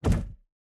enemy duck fly flap

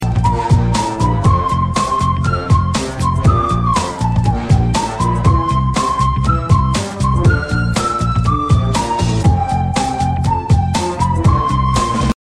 music theme; battlefield heroes

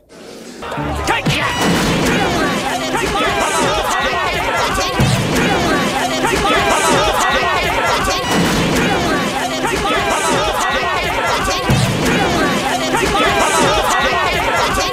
fighting

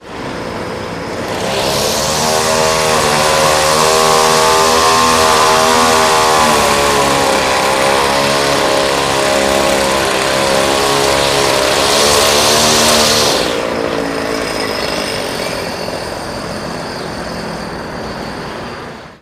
weed whacker

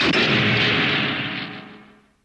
hammer; kaori

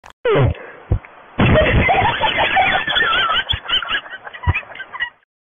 burst man laugh

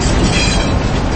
conveyor belt sound; lego star wars

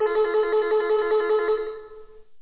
softphone ring

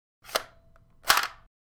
nerf gun reload

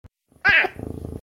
serval mrow

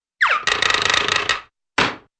zip down and quickly hammer down pole

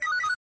garmin beep

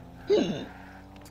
hiccup

